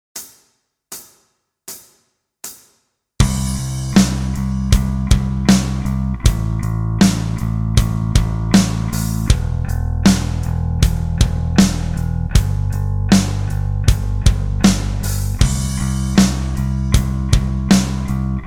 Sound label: Backing Track; chord progressions mastery